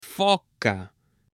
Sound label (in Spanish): FOCA H